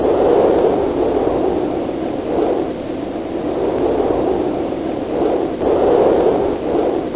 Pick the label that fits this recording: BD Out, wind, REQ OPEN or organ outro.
wind